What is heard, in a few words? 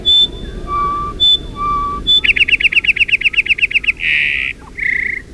song sparrow slow; piano